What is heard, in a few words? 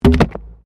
PLASTIC RUBBER PIPE